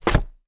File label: foley booklet instructions in packet place down in drawer; zapsplat